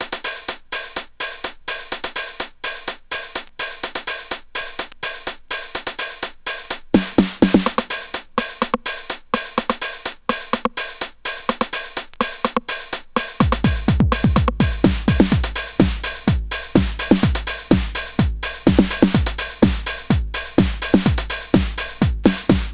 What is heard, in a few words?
clap your beats